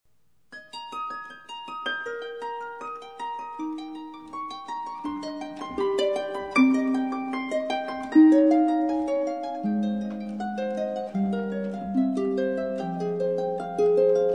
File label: Duo, harp; classical